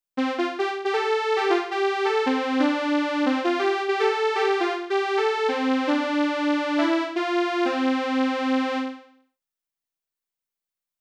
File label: pulverizer sample